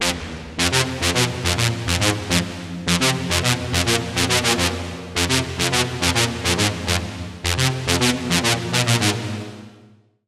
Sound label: Lead